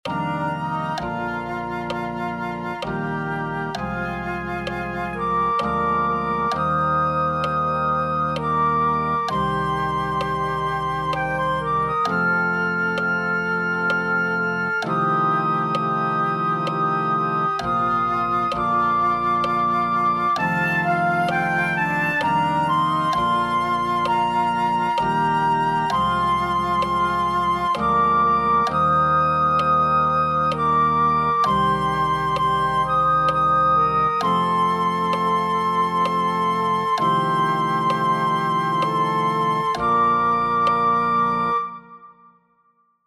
flute

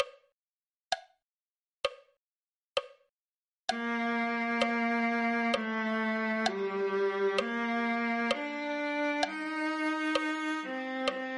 viola

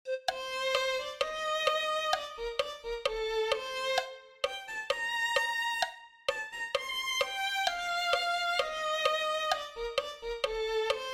violin

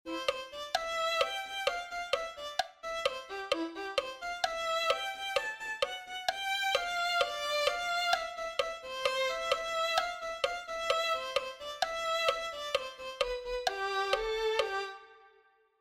violin

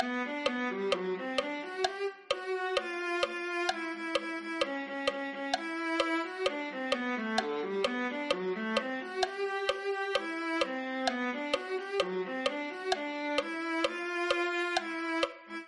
viola

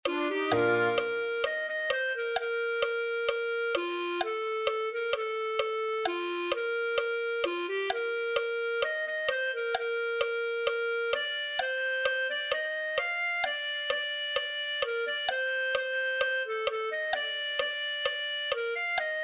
clarinet

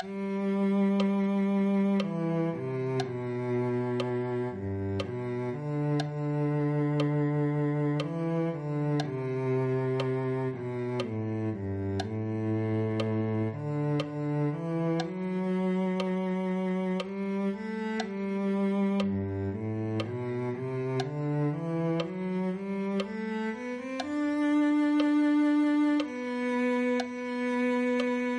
cello

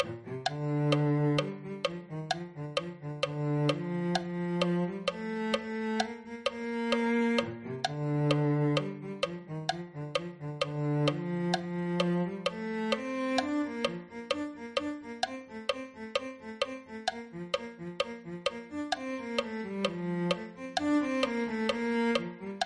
cello

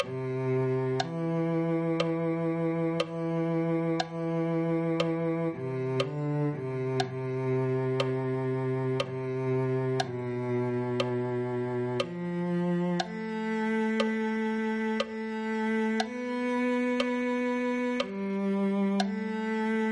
cello

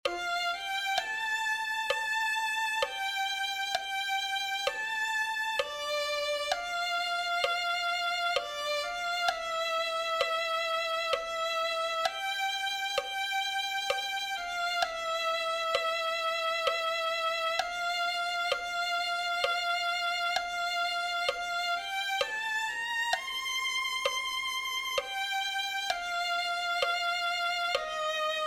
violin

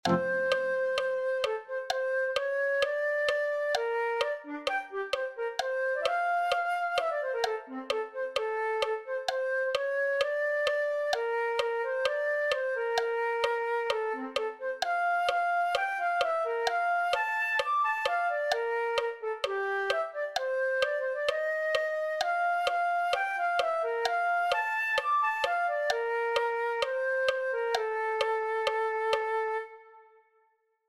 flute

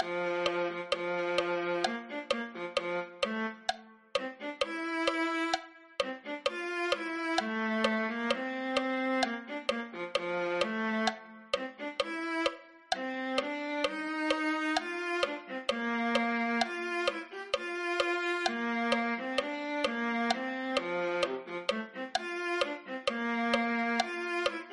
viola

